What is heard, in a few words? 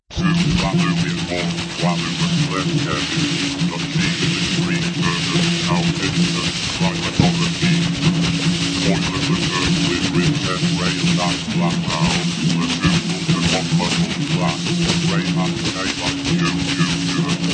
Laptop minimal electronica glitch